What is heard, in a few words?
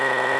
cheap med motor; astrorally